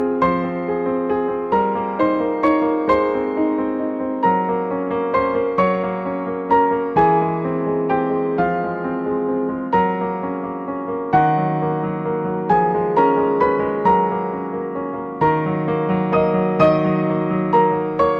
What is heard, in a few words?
Musical Box